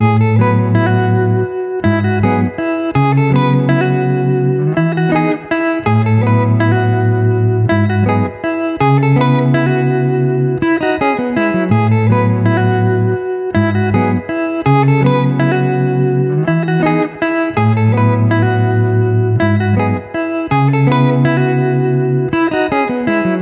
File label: guitar loops; Cymatics; Crystals